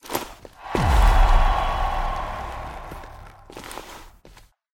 Emote Flex Foley; fortnite emotes; Athena

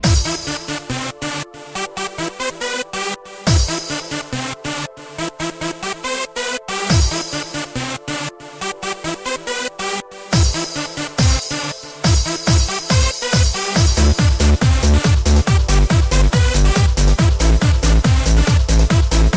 disco

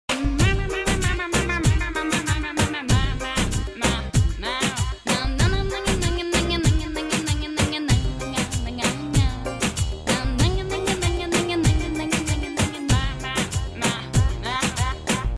music; Jail

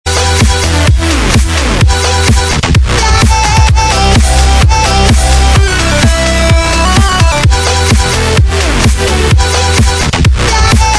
rocknroll

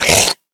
spider hit

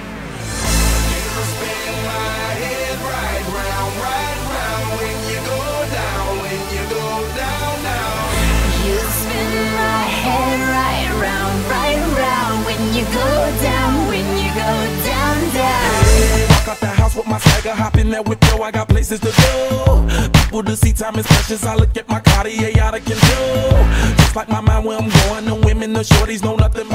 music

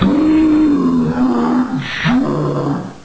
boom slow

